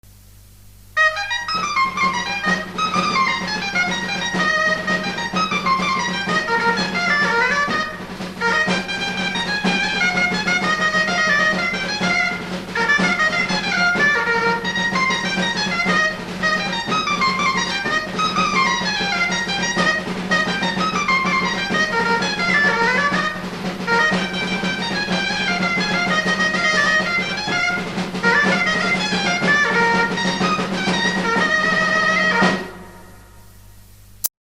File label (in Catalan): Toc de corregudes i cucanyes; Sorita